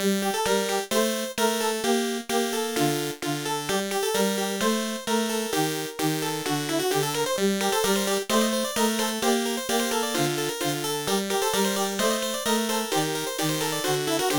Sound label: OPL3; Tetris